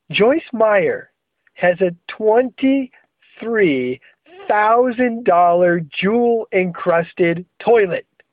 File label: toilet